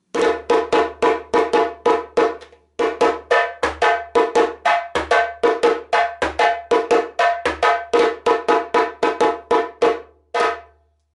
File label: djembe